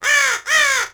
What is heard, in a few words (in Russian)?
Kar kar; Ворона!